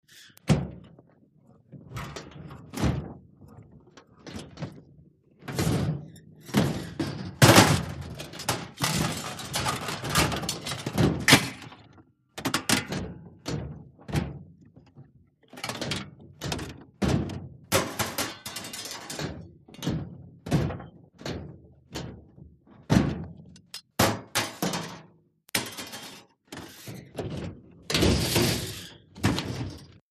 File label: Junk Clatter metalli, crashes